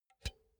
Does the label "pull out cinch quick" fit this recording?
Yes